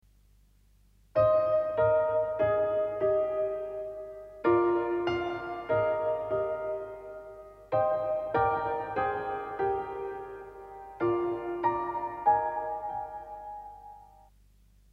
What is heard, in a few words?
PIANO